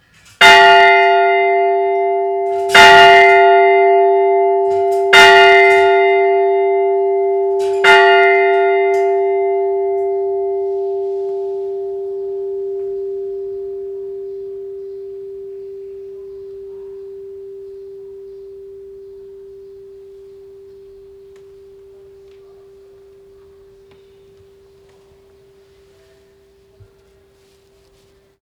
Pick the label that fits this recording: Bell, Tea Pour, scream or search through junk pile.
Bell